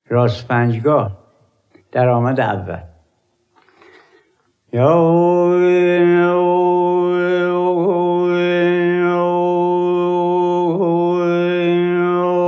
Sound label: daramad, rast panjghah